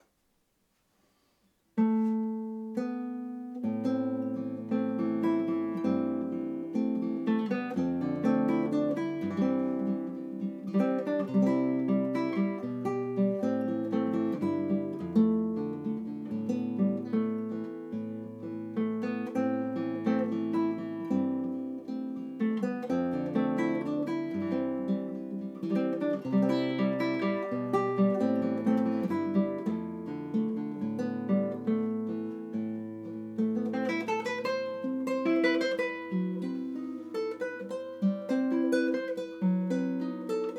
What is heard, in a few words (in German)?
Großmembran T-Bone SC450 1 5 Entfernung